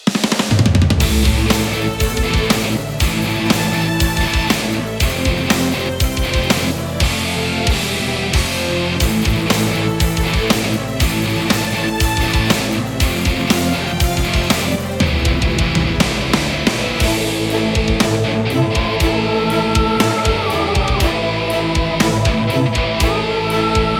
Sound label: TENSION